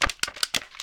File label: tree monster; server-nalc